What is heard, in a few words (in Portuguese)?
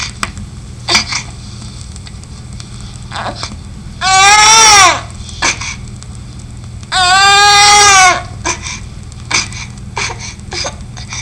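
gemido bronquico, sons e choros pediátricos; GEMIDO BRÔNQUICO : ASMA, BRONQUITE; PEDIATRIA UFF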